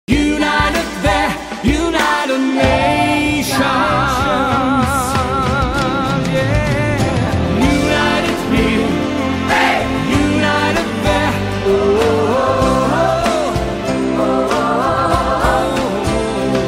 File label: music